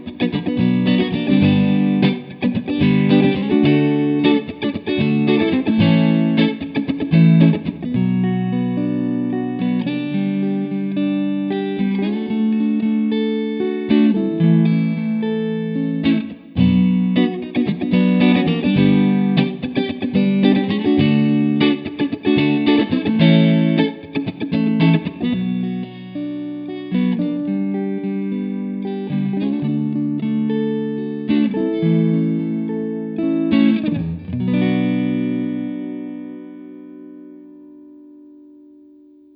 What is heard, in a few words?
Strat clean